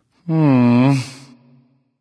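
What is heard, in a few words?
thinking, nonverbal; smite; voicepack; khepri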